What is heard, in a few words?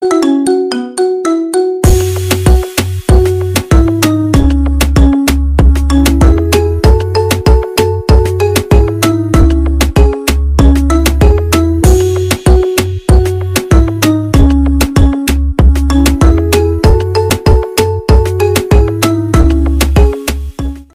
marimba remix ringtone